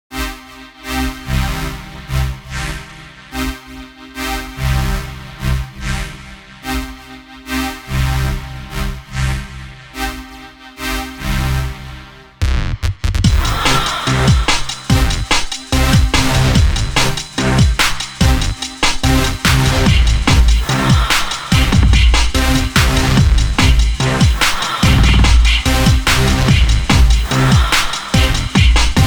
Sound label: electronica downtempo chill